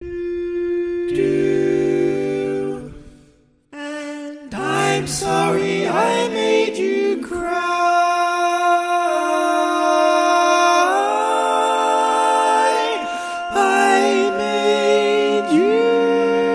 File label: Tenor, barbershop tags